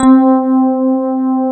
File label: FM ELP C3 L, Keyboards Old School; USB Soundscan vol.09; AKAI